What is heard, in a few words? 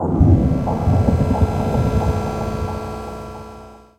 Wheel Nap